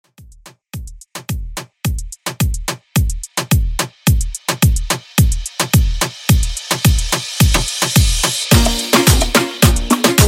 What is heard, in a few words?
Reggaeton 108 Bpm